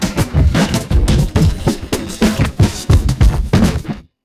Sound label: breaks